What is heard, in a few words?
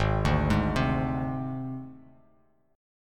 Gsharp Major Flat 5th, Bass Standard; arpeggiated; 4,5,6,5